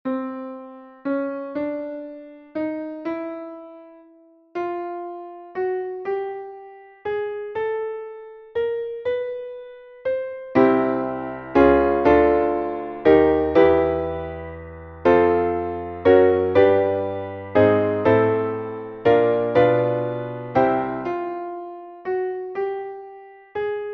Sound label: Mixed Mode Major C F G